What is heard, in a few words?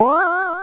spring Ball; N64; YOSHISISLAND